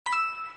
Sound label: coin, regular yellow coin; Nintendo Switch; Super Mario Odyssey; Mario's newest 3D adventure on the Nintendo Switch; Mario Coin Sound Clips